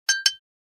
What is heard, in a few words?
Tapping paint brush against glass remove excess paint